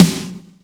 snare, DnB Breakz Kit; Breaks N Beats Sound Kit

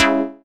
Synths C#; Vengeance Samples

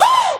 Vocal Oneshots; Vengeance Samples; Vocals

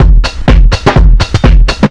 drum loop 125, fatdrive, vocal house; PS-28 Vocal House Samples; drumloops

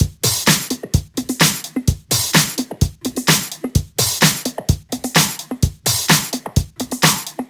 128BPM Kit; Vengeance Samples; VFH1 128BPM Pills Kit

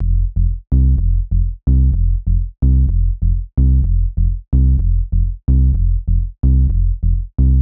126BPM Bass Root F, Tech House; Offshore; Vengeance Samples; Bonus TechHouse Kits

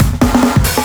Fill 140 BPM

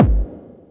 kick; Breaks N Beats Sound Kit

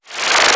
Sweeps Short

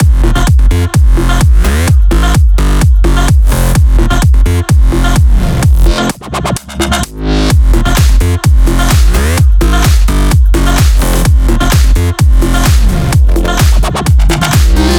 128BPM Mix; Vengeance Samples; Body Kit

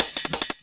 hat; Breaks N Beats Sound Kit

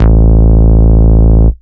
Bass Root A

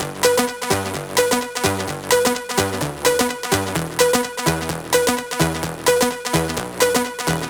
Melody 2, 128BPM; Jelly Kit

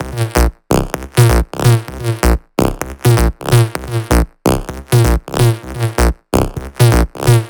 Melody Kits 128BPM Root A#; Vengeance Samples

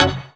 Synth Shot; Vengeance Samples

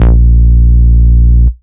Root A#, Ultimate Bass; Vengeance Samples

